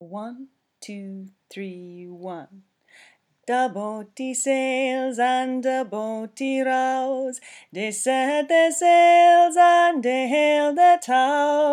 Da Boatie melody

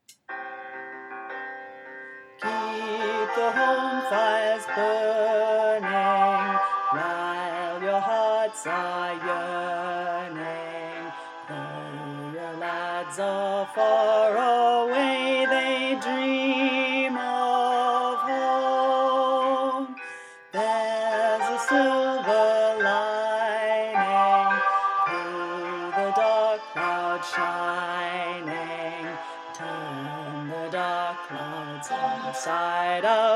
Tenor and Bass, Folk Choir; Voices of Remembrance resources

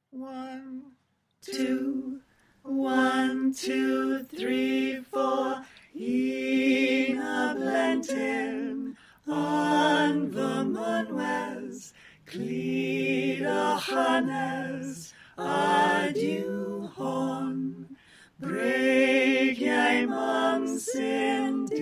Soprano panned R; Folk Choir; Suo Gan Resources

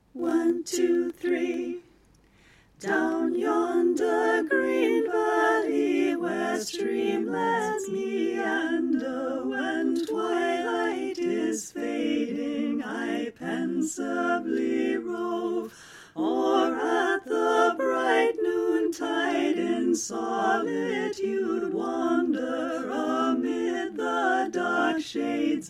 The Ashgrove alto panned R; Folk Choir

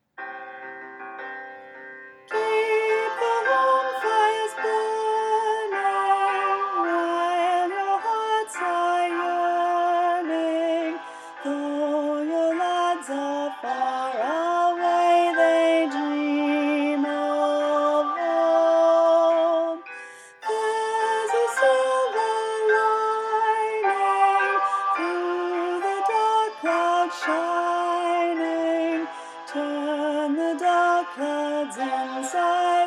Alto; Home Fires; Voices of Remembrance; Folk Choir music

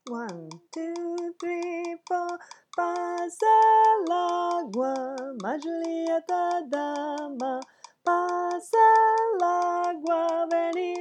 middle part

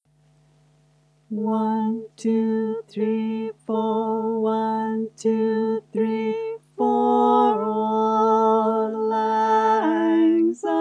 Auld high and bass chorus; Auld Lang Syne Resources

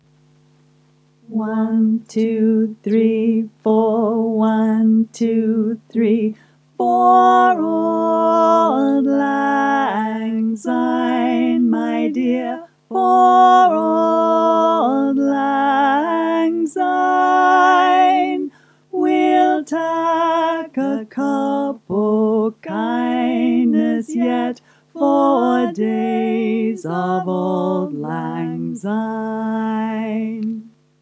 Auld lead and bass chorus; Folk Choir; Auld Lang Syne Resources

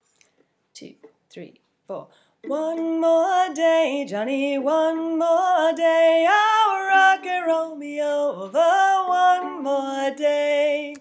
alto melody, Folk Choir music

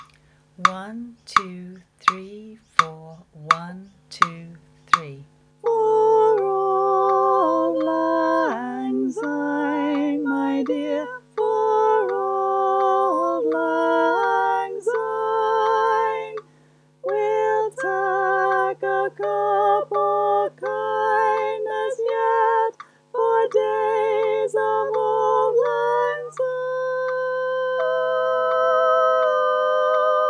Auld Lead-sop coda in C; Auld Lang Syne Resources

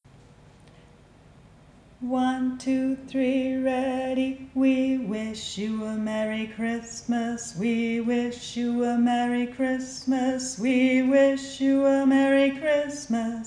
We Wish You A Merry Christmas MIDDLE SOLO; Folk Choir music

